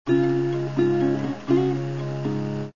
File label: guitar